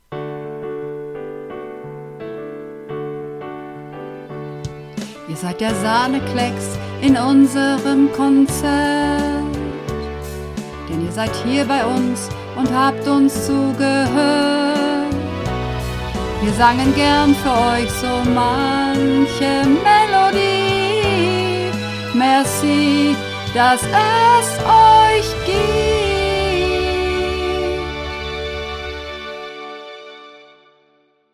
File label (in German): Merci Sopran, uebungsaufnahmen; Werbemusik